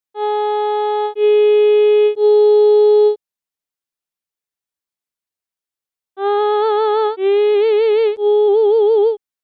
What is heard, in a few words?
Synthesized Singer